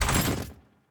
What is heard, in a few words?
reroll; ui; underdraft; economy